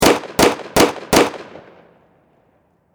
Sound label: Warfare Pistol 45 Springfield Four Shots Close Perspective; Pistols